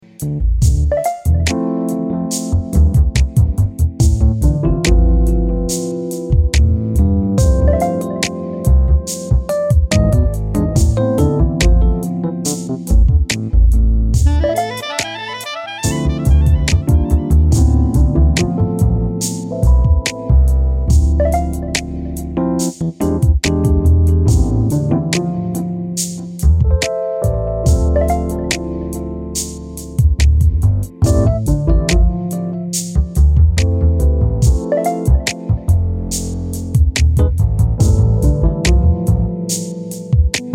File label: instrumental